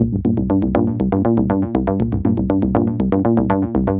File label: Delay Bass; Sonic Foundry (Sony Creative Software); The Electronic Music Manuscript Collection; Bass Foundations